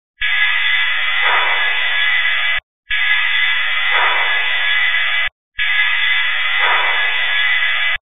Basement Loud bang; Knight Hall, Laramie, WY; After saying a few words about the basement, there are a series of mysterious bangs and clanks; EVP; "Loud and Soft Bangs and Clanks"

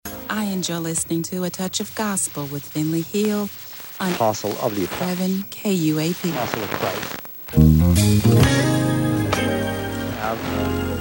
JAZZ, FM DX; RDS CLEAR ID - Es (1097 miles); KUAP 89.7 PINE BLUFF AR 50; Arkansas

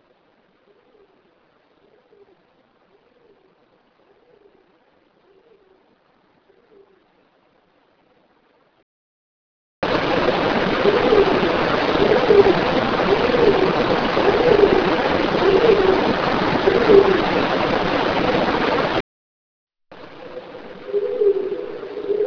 Weird Noise; The same whirling type noise only a different pitch and increased frequency; Weird Noise 1; Bisbee/Tombstone

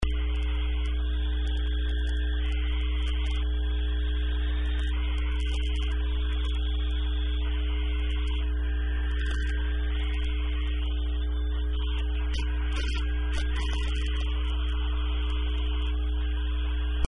morse 14 mHz; dx

